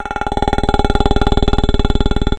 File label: Poink Cartoon Thud Lo; cartoon gadget fx